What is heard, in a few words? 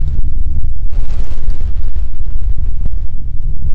speaker rumble; LOOPING AMBIENCE